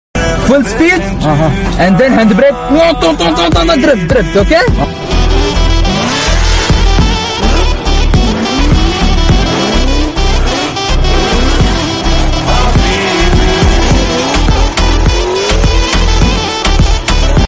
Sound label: full speed then hand brake instrumental afgani